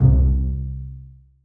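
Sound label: basses pizzicato c3; Sonatina Symphonic Orchestra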